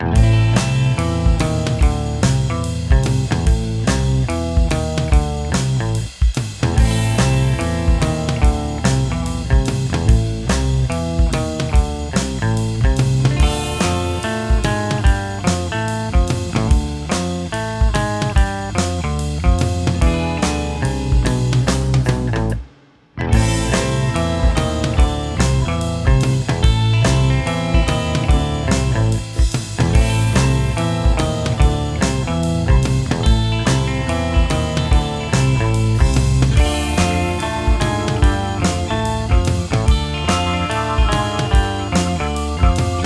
Surf Guitar

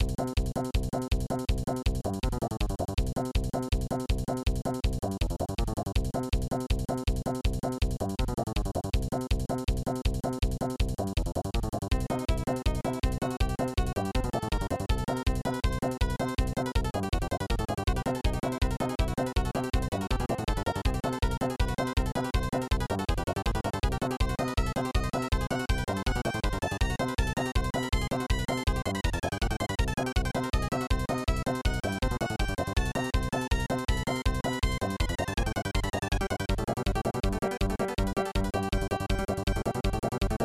Level Music; Harley's Humongous Adventure; snes

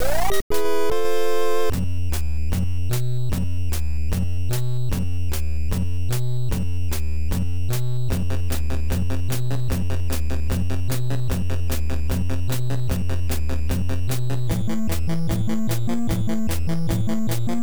Chill Clear; Dr. Mario; NES

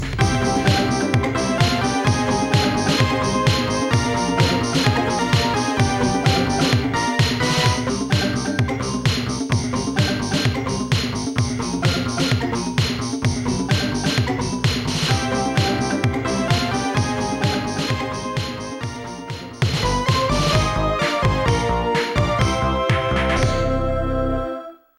Continue GAME OVER; Namco Video Game Graffiti Volume 10

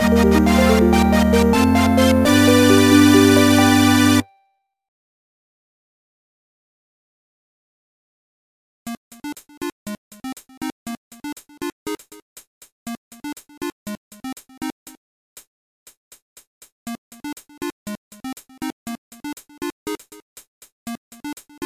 Fanfare; tetris ds

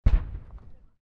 Flak Shot; Balloon Invasion

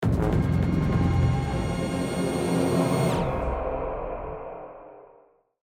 Med Robot Single High Intro; Horizon Zero Dawn; PS4

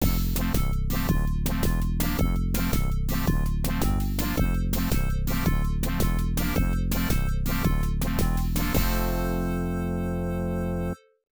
Fun Rating Jingle; lemmings 3d pc

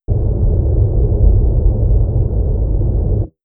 Swoop; Universe Sandbox 2

